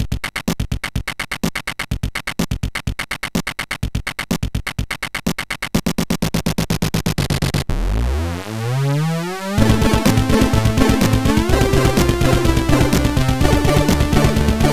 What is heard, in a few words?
Beat Box, MOS6581R2; sid